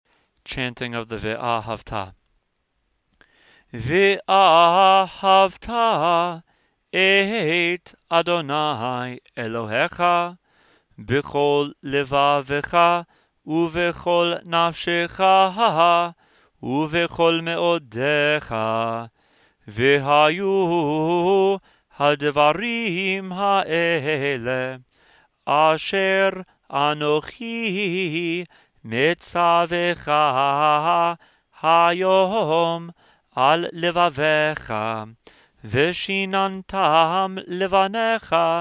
Vahavta Chanted

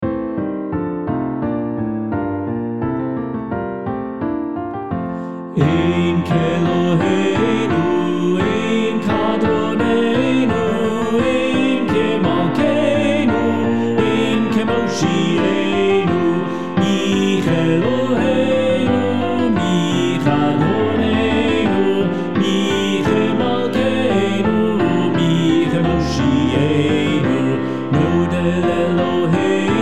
Ein Keloheinu

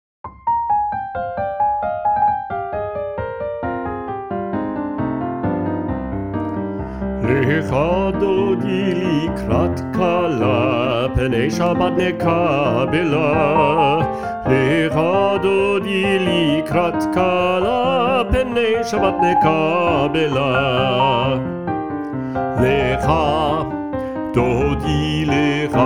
Lkha Dodi BASS MIX

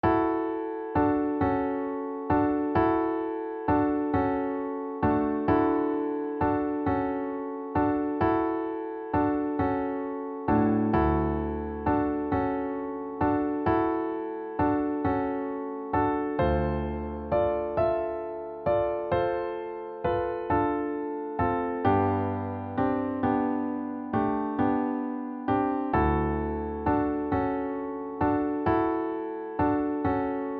Candle Blessing MEN MIX

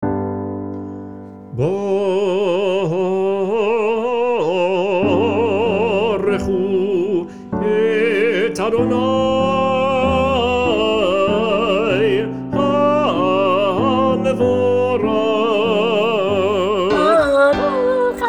SOPRANO; Barkhu; Lewandowski

